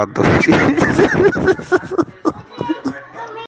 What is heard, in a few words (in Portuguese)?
risada